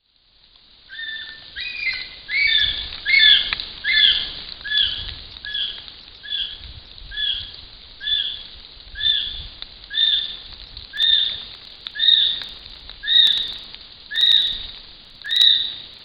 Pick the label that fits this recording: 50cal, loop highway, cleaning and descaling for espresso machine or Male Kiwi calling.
Male Kiwi calling